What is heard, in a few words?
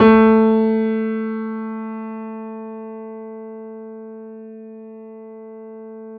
Steinway